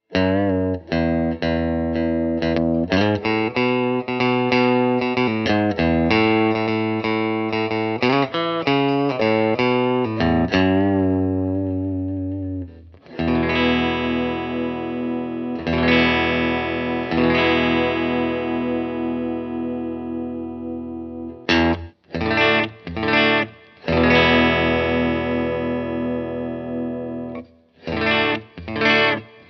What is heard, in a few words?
bridge, custom pickups